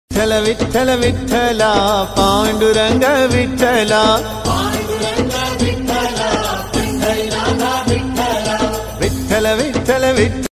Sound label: marathi bhakti geet ringtone marathi bhajan ringtone marathi abhanga marathi song ringtone